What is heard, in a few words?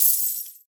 coins scatter; blackjack